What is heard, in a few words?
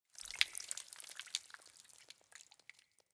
Water Shallow Exit Drips Dirt; Entity Contact Movement